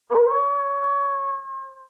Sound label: SFX 3D Warbeast Howl; Puzzle Chronicles